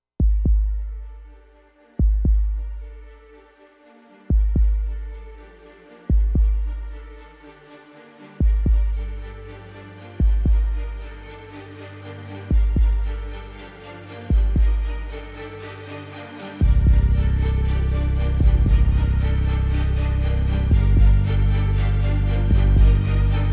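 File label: Uncharted No Hi Synth No Snare, trailer music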